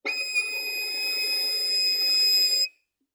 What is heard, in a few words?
Violin arco ff sul E Eb6; MIS Pitches - 2014; Strings